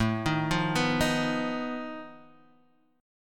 F 7th Flat 5th A

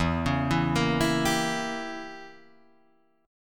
E Diminished 7th E, Guitar Drop D

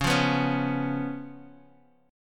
B Suspended 2nd Flat 5th, Db, chord

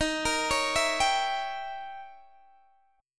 D sharp 7th Flat 9th, Guitar, Open G

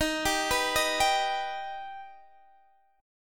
D sharp Augmented D sharp, Guitar, Open D

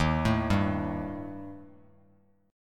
Eb Major Flat 5th Eb Bass Standard; EbMb5 arpeggiated; x,6,7,0; JGuitar

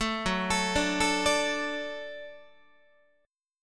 Gb Minor Sharp 5th Gb Guitar; arpeggiated; JGuitar

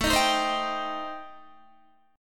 A-Minor 7th Flat 5th, Guitar, chord; Am7b5; strummed; 16,18,15,17,15,15

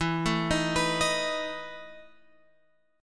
E Major 7th Suspended 4th E, Guitar, Open G

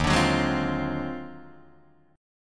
Eb Minor Major 13th, Guitar, DADGAD; EbmM13; strummed; JGuitar

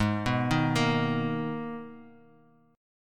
G sharp Minor Add 9th G sharp; madd9:1,b3,(5),9

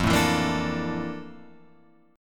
F Major 13th F down Guitar Open F; strummed; JGuitar

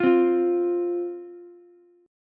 F 5th, Ukulele; strummed; chord; 10,12,13,x; JGuitar

